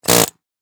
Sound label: Industry Air Hammer Operate Short; Hammers; Tools